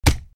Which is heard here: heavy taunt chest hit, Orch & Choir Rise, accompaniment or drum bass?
heavy taunt chest hit